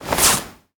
tear fabric

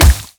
water gun

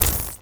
atk benumb

